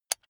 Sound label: electric button drill direction switch lock heavy